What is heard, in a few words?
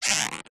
cartoon stretch pull elastic